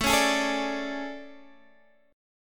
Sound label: A Major 11th, guitar